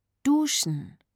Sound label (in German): duschen